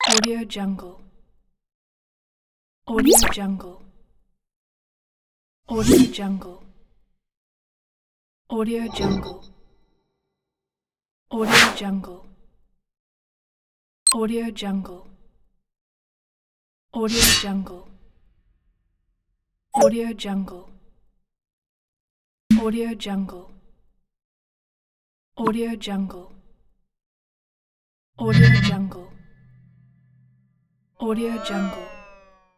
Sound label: whoosh ui